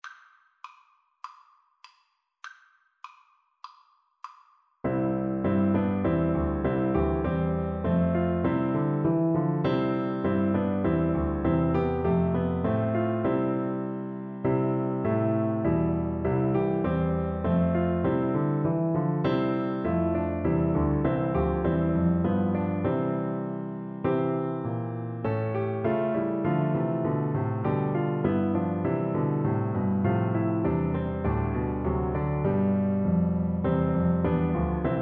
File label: angels FL kar, Flute